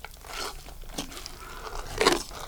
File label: EATING